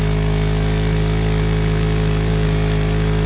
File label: engine 5000; xmoto